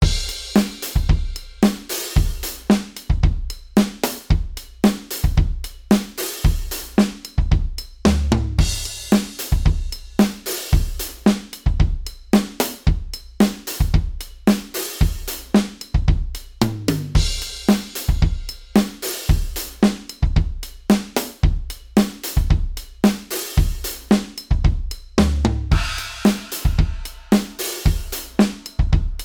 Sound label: modal surf, drums